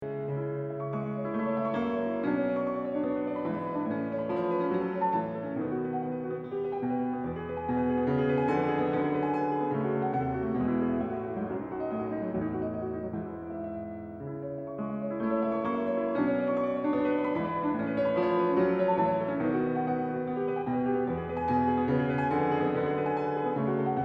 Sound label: Symphonic Etudes, Piano; Schumann